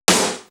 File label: Snare, Drum One Shots